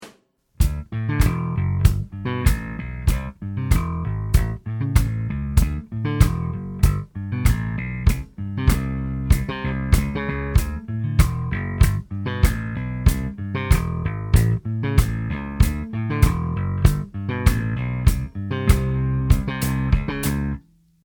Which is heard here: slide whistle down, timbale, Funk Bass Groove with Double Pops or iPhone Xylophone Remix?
Funk Bass Groove with Double Pops